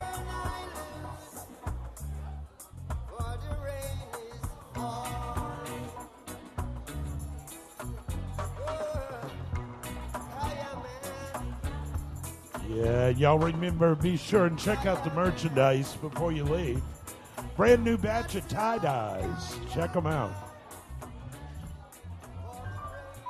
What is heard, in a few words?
tuning set intro